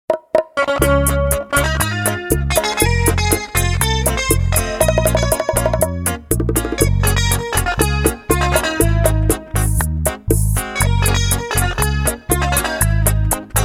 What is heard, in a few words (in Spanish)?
Guitarra